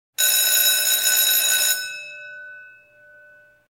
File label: School Bell Short Ring Interior Hall Close Perspective